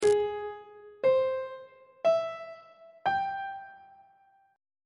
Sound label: f melodic minor 7th chord 3 is a flat augmented major 7th; on treble clef